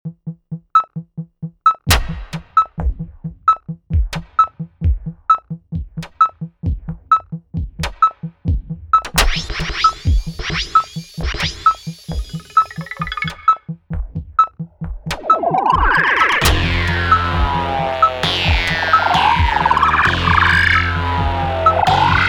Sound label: Rude Synth